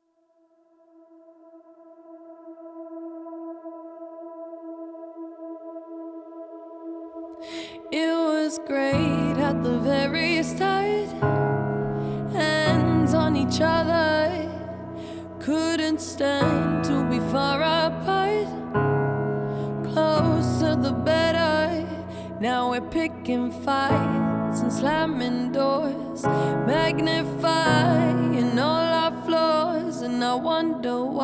acoustic version

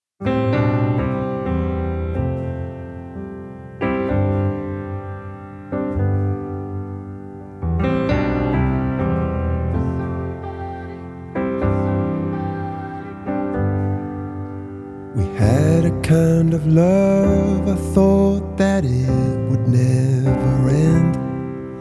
piano version

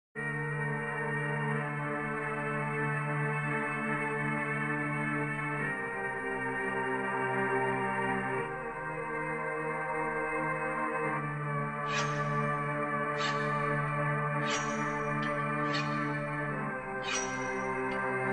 instrumental

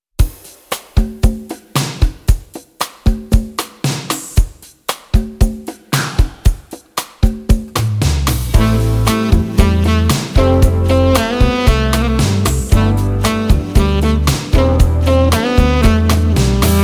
acoustic version

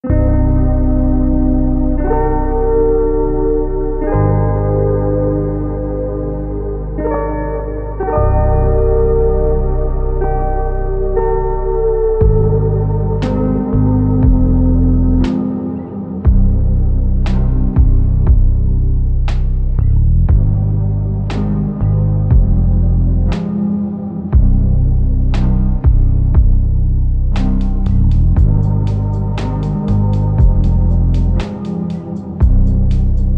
instrumental slow

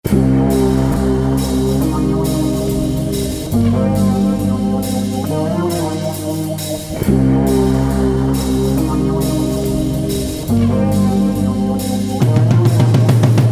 instrumental

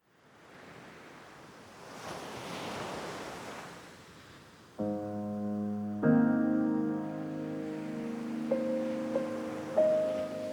piano serene view point with ocean waves

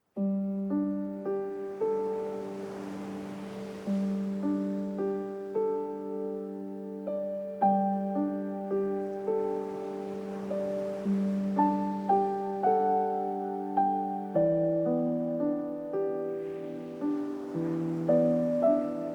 piano stars and midnight sea

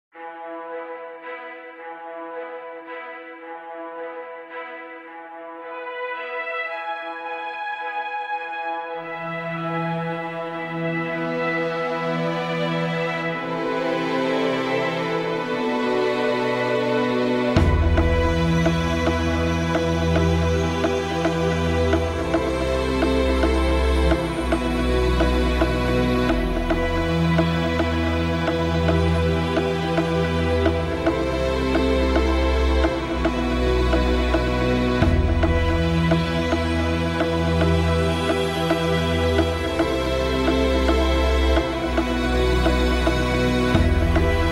instrumental

